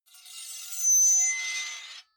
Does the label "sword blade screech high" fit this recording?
Yes